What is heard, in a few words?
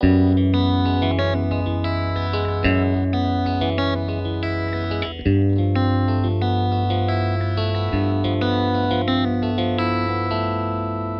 STRAT POS 2 LICK, S pickup set